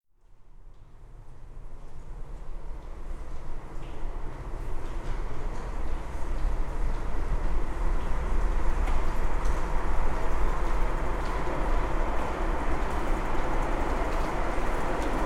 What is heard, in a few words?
station hansaring